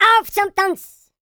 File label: german, YODEL YELL; ILIO; Vocal Planet